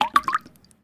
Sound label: Drip Notifications